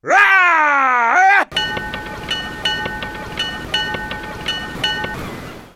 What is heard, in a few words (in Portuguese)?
Grito e sino de navio